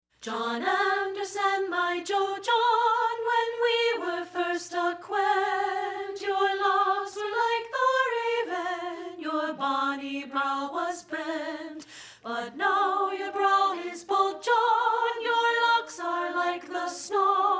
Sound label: SOP1; JOHN ANDERSON